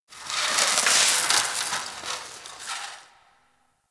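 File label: Ice Sizzle Random Ambience; Warframe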